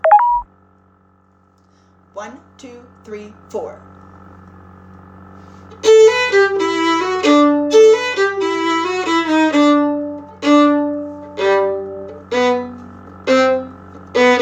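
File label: Violin; Entertainer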